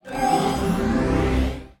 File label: Challenge Start; Quests